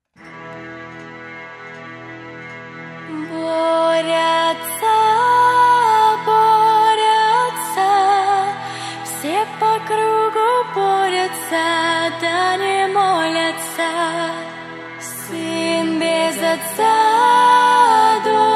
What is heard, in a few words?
acapella; cover